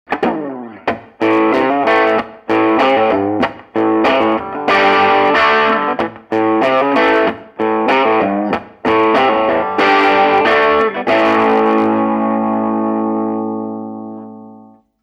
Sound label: Git10 Vol5 Tone10 Boost0